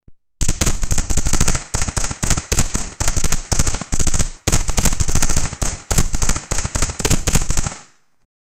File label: a pistol fight with ricoc